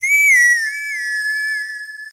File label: Horse Call